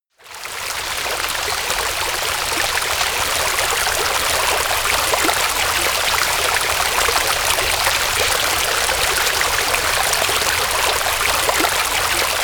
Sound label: small stream